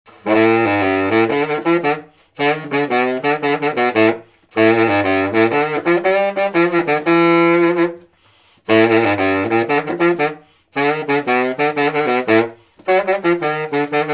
Keilwerth Bundy Bari Tramp

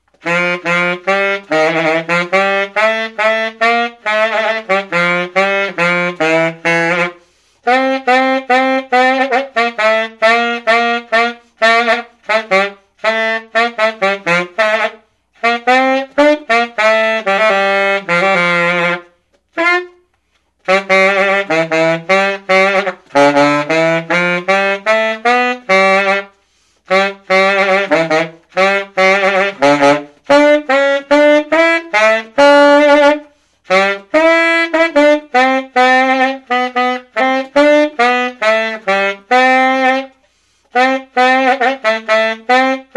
Buescher Gold C Mel Snaps America C Key

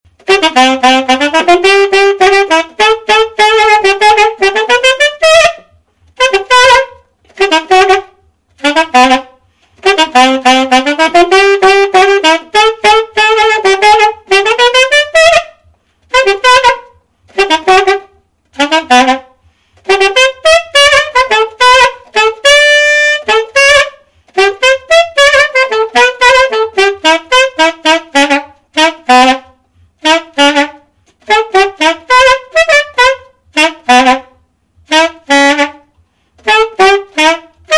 Kohlert Winn Alto Dixie

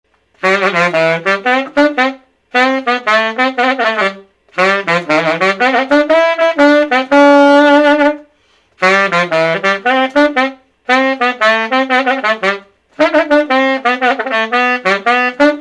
Buescher Gold C Mel, Sax

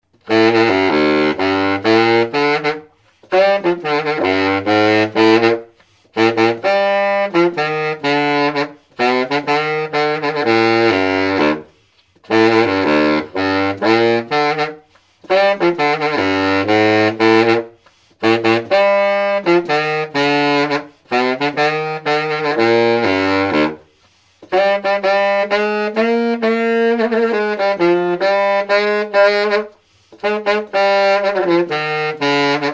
Keilwerth Bundy Bari, Sax

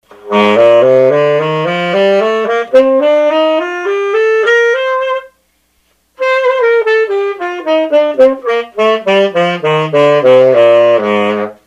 Buescher Killer Bee Big B Tenor Scale, Sax

